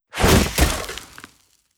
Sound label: Body Fall Roman Soldier Wearing Armour Helmet Dirt